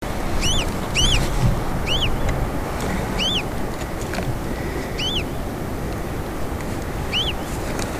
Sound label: Great Rosefinch Carpodacus rubicilla rubicilla, Ladakh